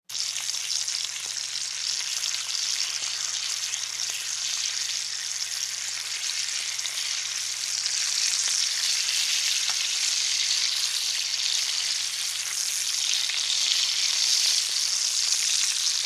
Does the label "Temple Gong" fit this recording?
No